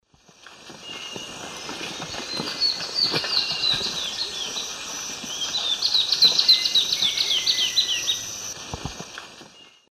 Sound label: Hylophylax naevius, varzea, duet; S. America; Eastern Lowlands